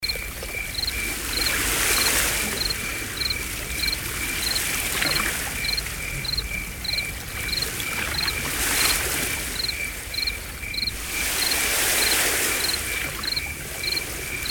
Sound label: sea waves